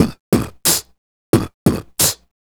Beat Box, Hip-Hop and RnB Vocals

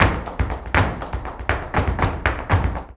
BULERIAS TAP, Flamenco

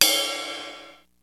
DPM3 kit, PEAVEY DPM; Rubber Chicken Software Sample Bank

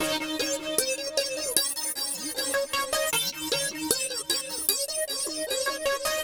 UNWIND, arpeggios; ILIO; Trance Fusion

